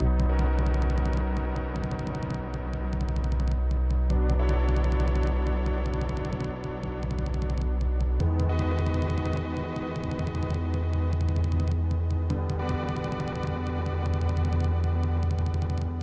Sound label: Organ; Jet Set Willy II Remake; Bonus Song